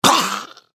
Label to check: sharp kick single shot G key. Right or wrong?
Wrong